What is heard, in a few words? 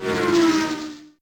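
Car Pass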